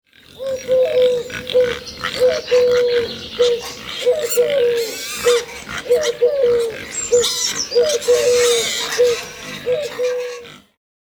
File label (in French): duo cochon tourtelle; loto sonore; loto des animaux